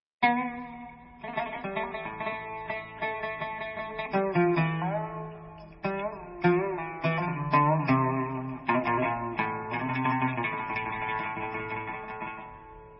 Debka, Instrumental

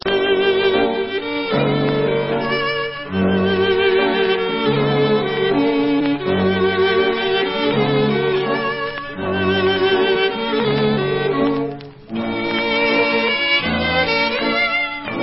Rumanian; Chiulandra

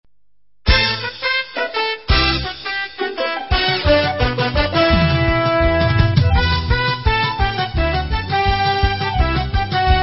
Instrumental

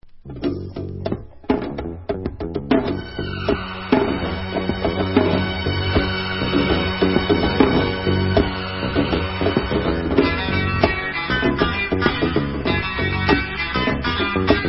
Debka Instrumental

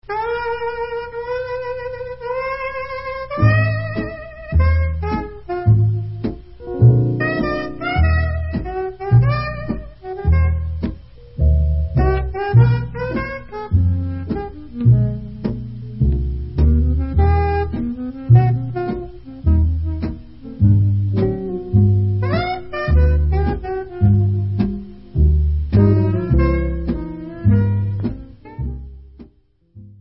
Jazz, Folk

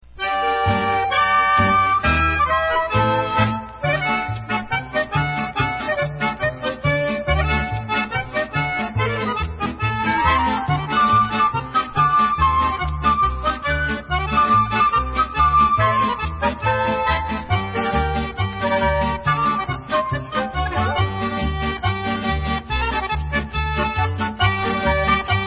Freylach, Instrumental

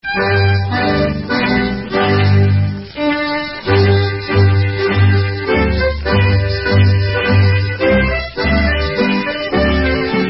Danish Instrumental; Mascarade

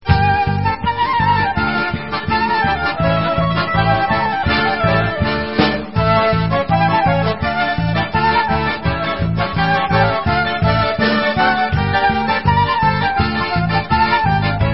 Korobushka Russian Instrumental

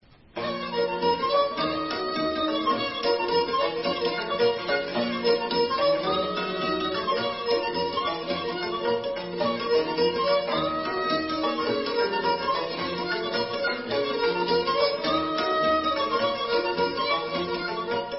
Hora Lui Chisar Romanian Oltenia

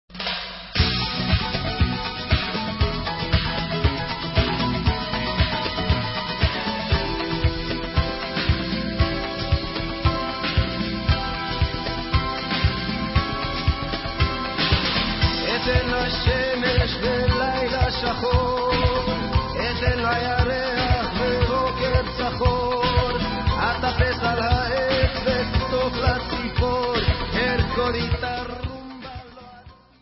Rumba

